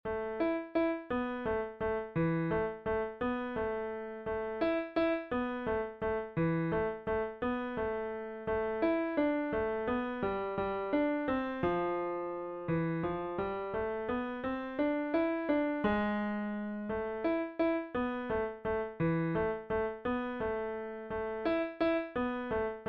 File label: Piano